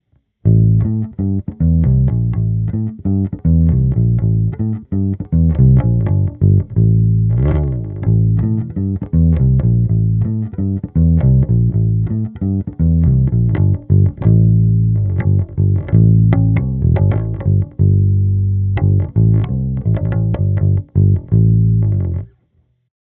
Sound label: Block 300B QP Flatwounds Cab